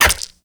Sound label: Dice Passive Slot Switch; Warframe; Koumei